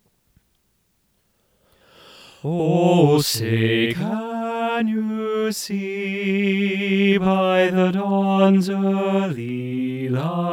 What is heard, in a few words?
ovation ssb bass 1 low, choir